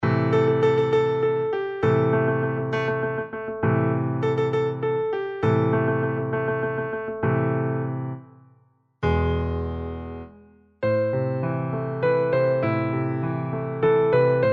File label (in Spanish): Oscilaciones